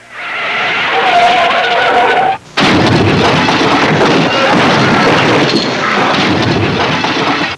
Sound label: CAR WREC, Auto; trasporti